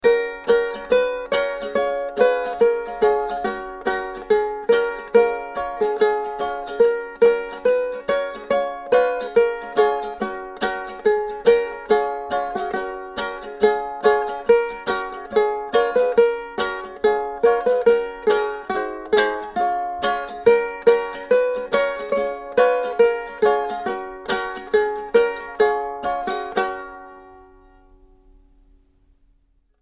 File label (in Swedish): Ukulele; 16 bit